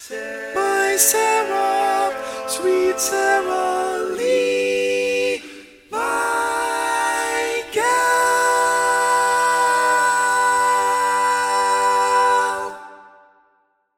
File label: Tenor, barbershop tags